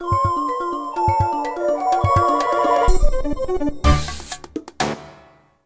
unused stage clear; Hotel Mario

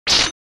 Whip Sound; Akumajou Dracula Level 3

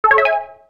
RED COIN

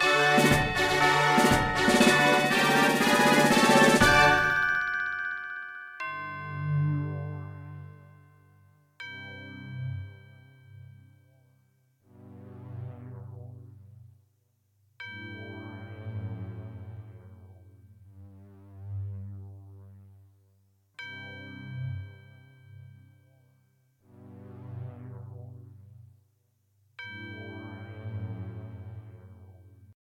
win; Pikmin2 FF

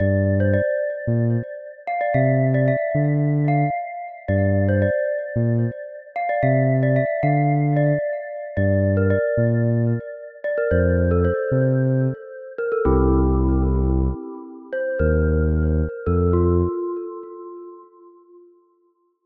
music strings; Bejeweled3

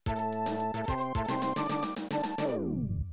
Unused Jingle; Tetris 2

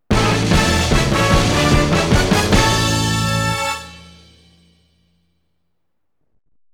1up; EMBB

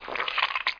SLIME; Frogger 1997

